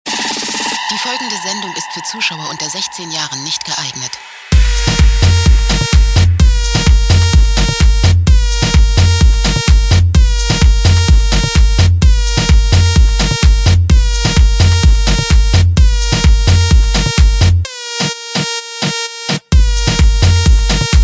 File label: Super Club Sound